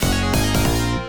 Coin; Burning Force; Sega; Megadrive-Genesis

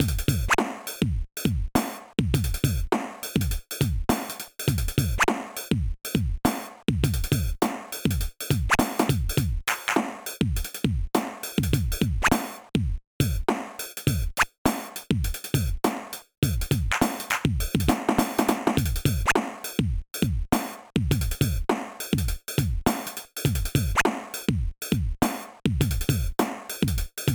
Menu Beats; ToeJam and Earl in Panic on Funkotron; Sega; Megadrive-Genesis

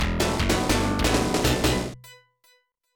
Victory!; Dr. Robotniks Mean Bean Machine; Sega; Megadrive-Genesis

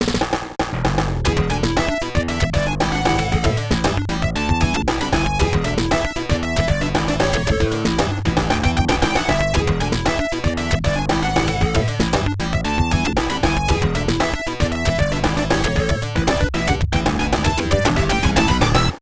Duet Victory Dance; Tempo (32X); Sega; Megadrive-Genesis

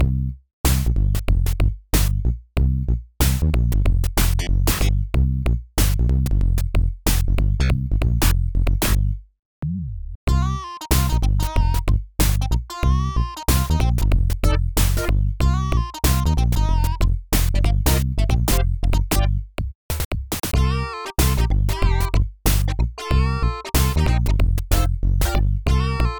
Mellow Groove; ToeJam and Earl in Panic on Funkotron; Sega; Megadrive-Genesis

